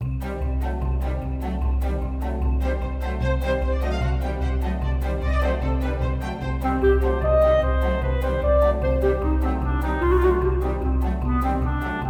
Orchestra; Undertale Deltarune

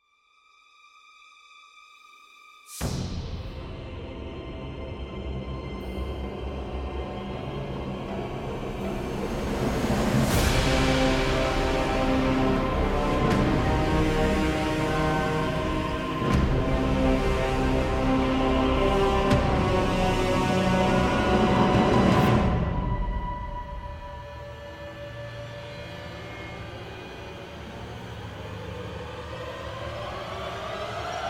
Epic Kaiju Style Orchestration

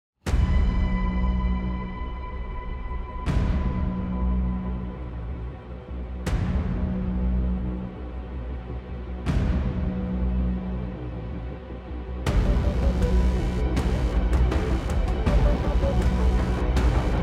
Epic Orchestral Cover

